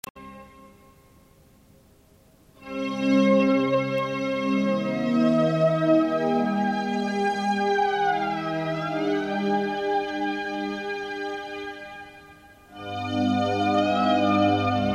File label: MIDI